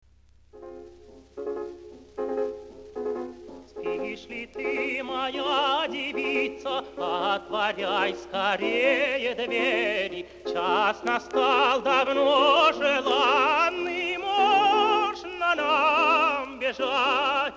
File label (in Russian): Испанская песня